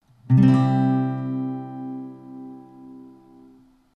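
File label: C, Acoustic Guitar chord; Acoustic Guitar Neck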